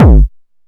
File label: BD DIST, Korg EMX-1, Drum Machines